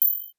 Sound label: Filter bell